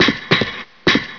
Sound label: metal